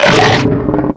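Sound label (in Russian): укус с проглатыванием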